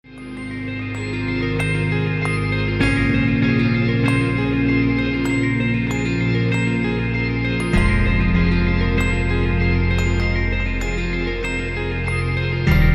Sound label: instrumental